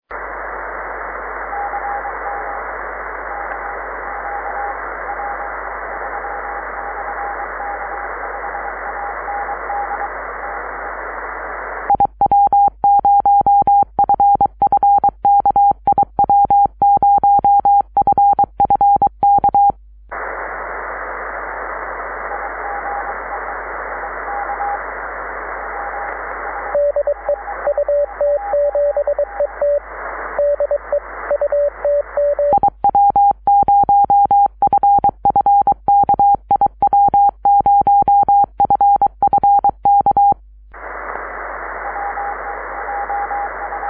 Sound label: cw; 6m